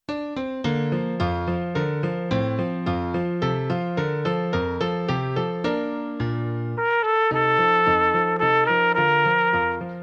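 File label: Minuetto - Solo With Accompaniment, trumpet; SOE Festival Solos Book 1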